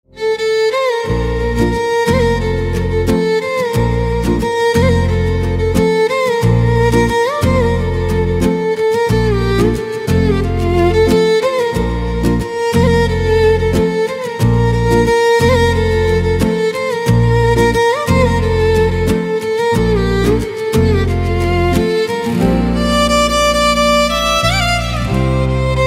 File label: Violin